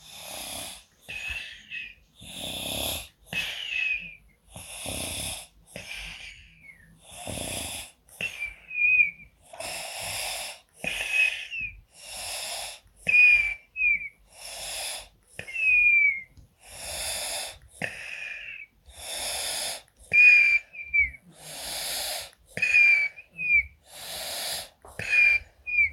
Baby With A Cold Snoring

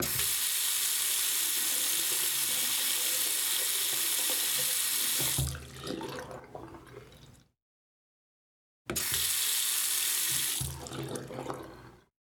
Running Water Sink

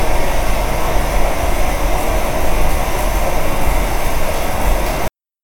Jetpack Idle Sound

